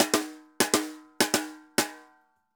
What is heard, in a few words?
Tambor Poly; Best Service; Festa Latina VOL-1; Drums & Percussion